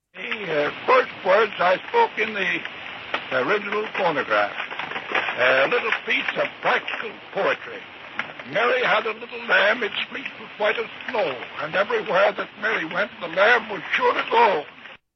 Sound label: edison, gramofonos